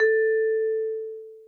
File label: VIBE A4, VIBRAPHONE; Greytsounds VOL-1